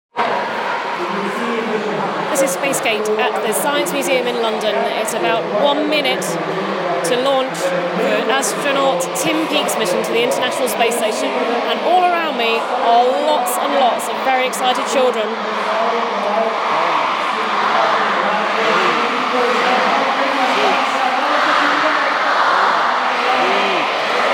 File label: kids cheer; at the science museum